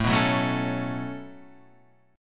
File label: B 7th Suspended 2nd, chord